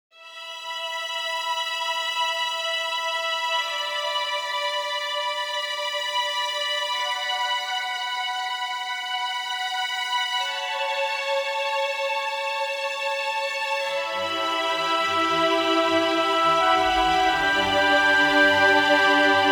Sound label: Emotional Uplifting Music